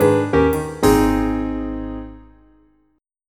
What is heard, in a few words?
jazz